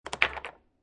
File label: Billiard Pool Snooker Ball Falls Into Pocket; Ball falls into pocket where there is already another ball; Lynne Music